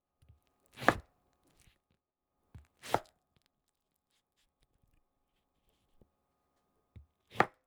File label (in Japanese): 果物を切る音